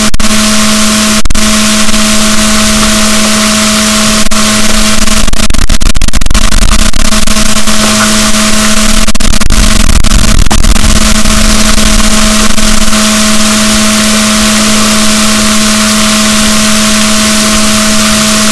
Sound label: rorquals negatif, boat